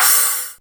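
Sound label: DRUMTRAKS crash, Drums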